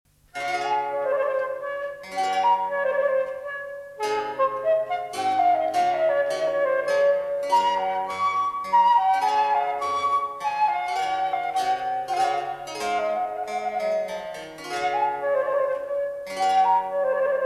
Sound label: Transverse Flute Pastorella; VINCI; Sonata